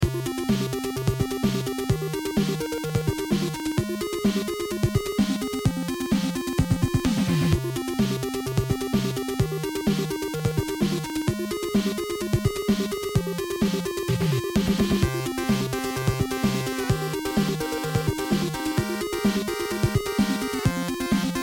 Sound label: beat